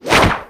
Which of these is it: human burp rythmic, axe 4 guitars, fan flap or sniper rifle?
fan flap